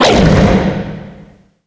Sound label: Voice Boss Surprise F; Pac-Man Championship Edition 2 Plus